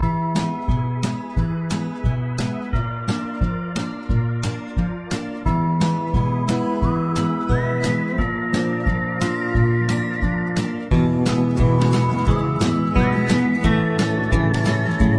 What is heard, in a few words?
Ingame Music Halloween; Hay Day; android